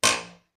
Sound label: spring